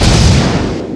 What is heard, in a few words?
Mine Explode; SWARM